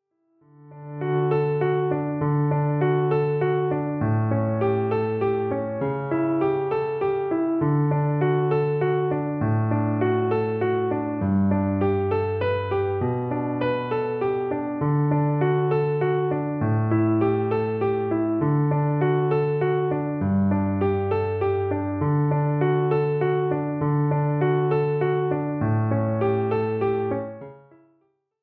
Lullaby

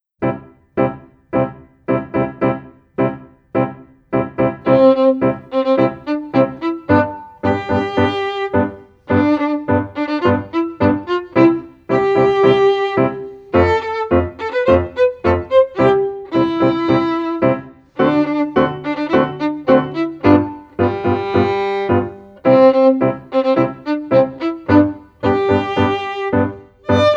Tango

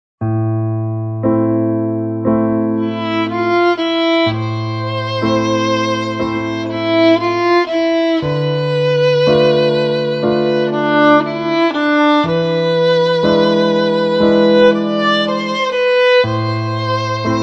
Czardas

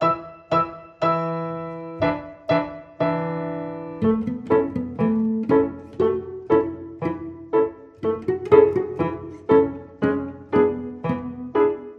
playing pizzicato

